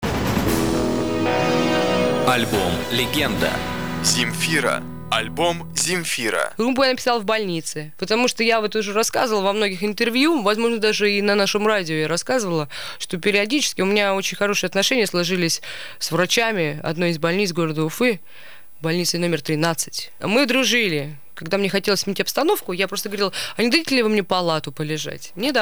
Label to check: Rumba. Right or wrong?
Right